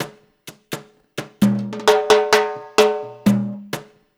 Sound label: LOOP, Percussion, Danzon; InVision Interactive; Percussion Slam